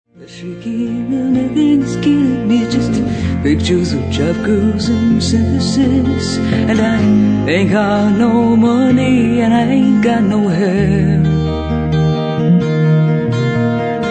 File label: Acoustic Cover